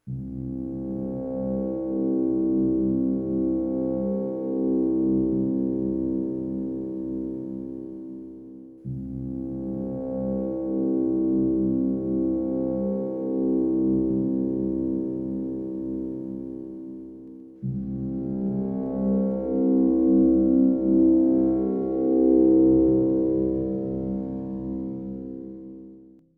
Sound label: drone; quicktime